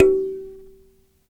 Dyna Sansa; Roland LCDP11 Africa VOL-1; Sansas

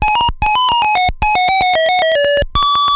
tune, ATARI

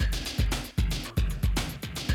Classic Drum Loops

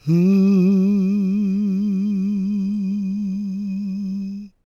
GOSP MALE, SOLO; Gospel

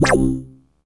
Synth Hit C; Zero-G Trance Inducer; 90 s Sample CDs

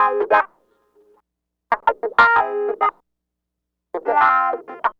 Clavinet, 70s Analog Funk

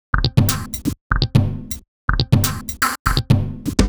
Top G# 123bpm, House Drumloops; Zero-G House Fabrik Drumloops

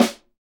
SD Real, Roland; Sound & Vision - Gigapack I

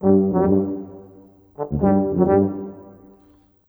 Brass, Orchestral, Rock Pop

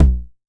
TOMS 909; Electronic; Wizoo - Platinum 24 Electronic

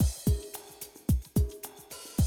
Classic DrumLoops, 110

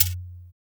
ETHNO SHAKE

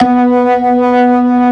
ORG VK, B-3 ORGANS Vinta Keys B3

